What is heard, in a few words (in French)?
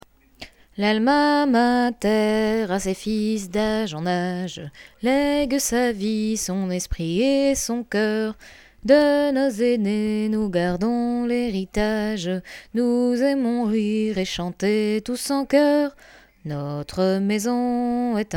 Chant; Chant de lANLO